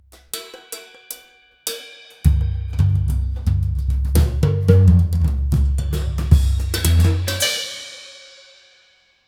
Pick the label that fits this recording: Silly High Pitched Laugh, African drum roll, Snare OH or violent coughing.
African drum roll